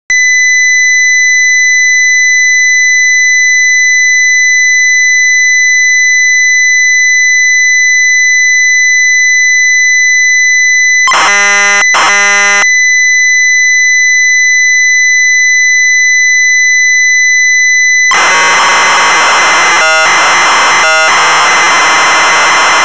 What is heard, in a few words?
MAJOR SCALES; Knights Library